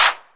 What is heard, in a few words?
Korg KPR-77, drum machine